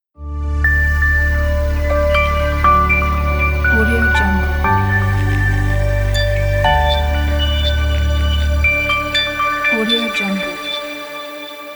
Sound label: bells logo